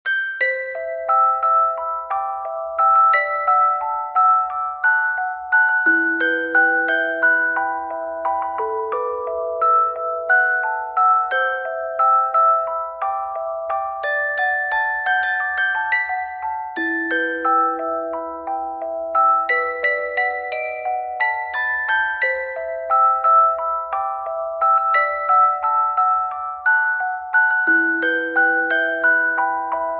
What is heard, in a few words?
Music Box; FMAB